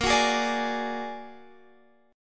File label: Bb Augmented 7th Bb down Guitar Open F; Bb+7; strummed; JGuitar